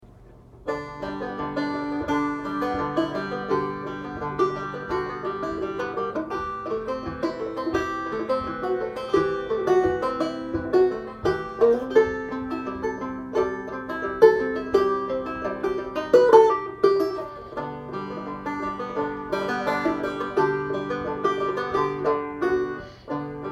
banjo noodles

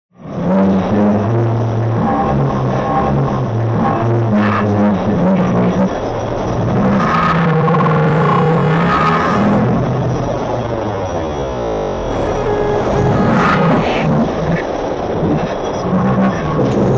single sample experimental slop; weeklybeats 2026

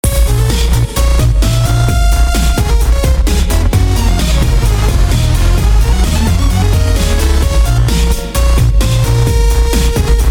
bass filth; weeklybeats-2020

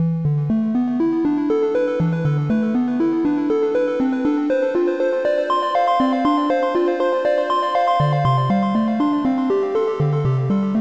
jrpg menu music

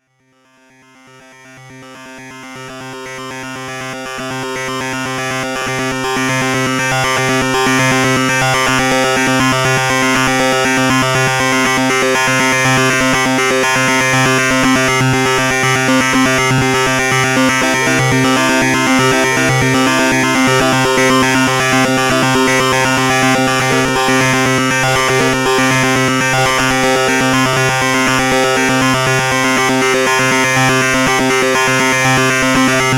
wave frame ambient; weeklybeats-2016

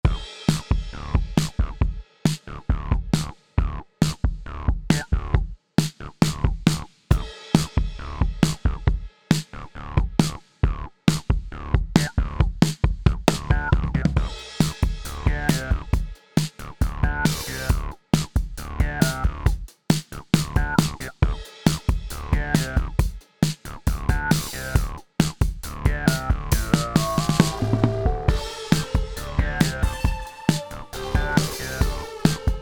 weird funky groove; weeklybeats 2026

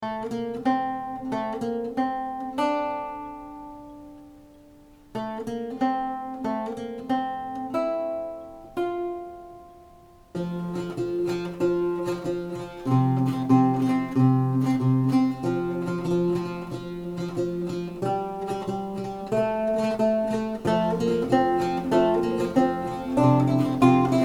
lute buildup; weeklybeats 2014

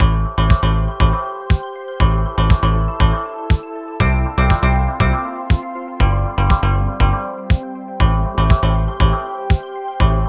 atmosphere; weeklybeats-2016

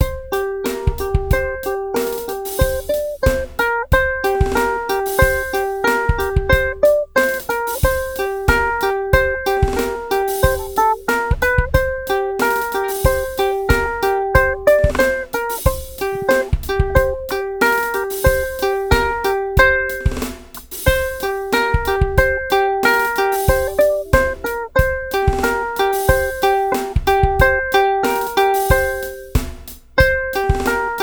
breakbeat uke; weeklybeats-2014

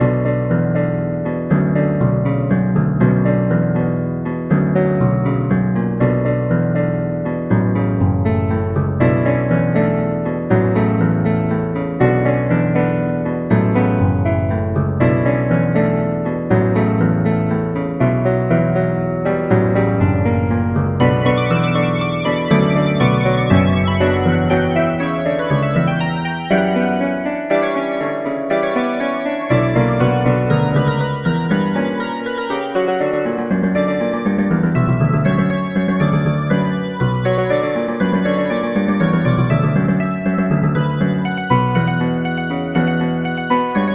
emotive fugue; weeklybeats-2014

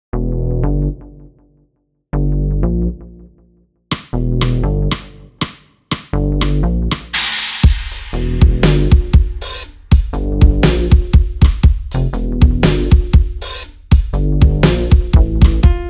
piano musings

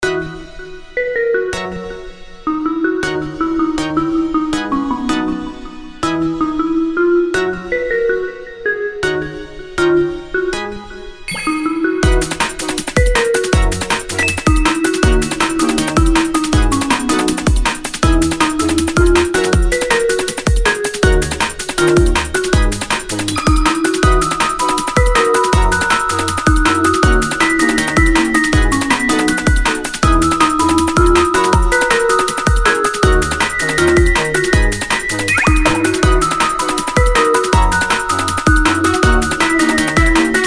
1 chorus kawaii future bass; weeklybeats 2024

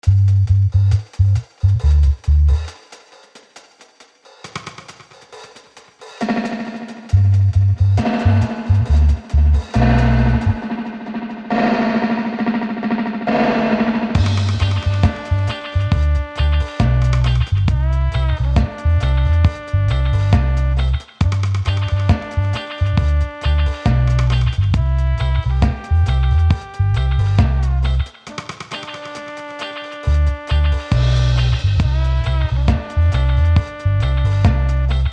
old style dub; weeklybeats 2024

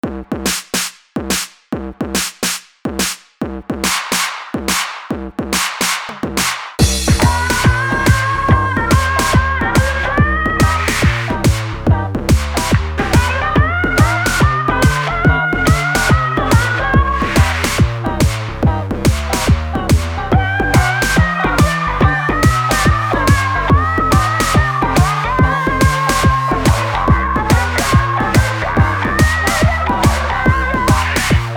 future bass blues; weeklybeats 2012

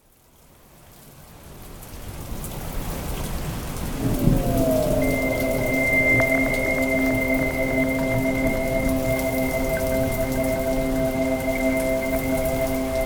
soundscape; spotted; weeklybeats 2014

